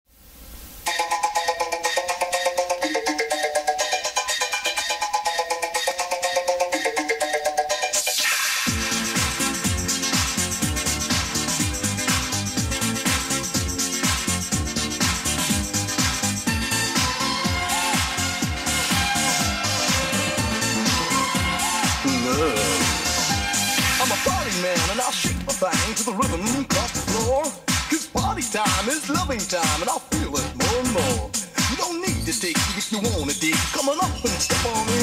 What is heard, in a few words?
90's euro dance remix, cassette deck BIC T-2